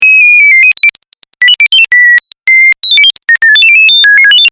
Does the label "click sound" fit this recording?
No